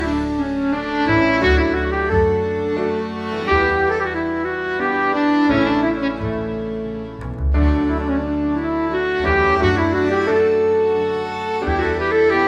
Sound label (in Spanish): Musica Escandinava Tradicional Musica Noruega Sueca y de Dinamarca; para leer Hamlet